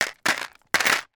industrial handful of small screws drop into plastic container